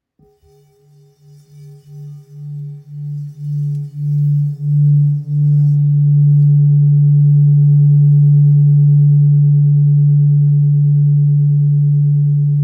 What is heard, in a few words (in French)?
Bol ancien sol diese